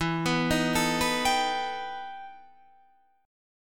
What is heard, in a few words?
E Minor Major 11th E Guitar Standard; EmM11 arpeggiated; 12,14,13,14,12,15